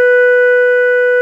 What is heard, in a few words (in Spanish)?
clarinete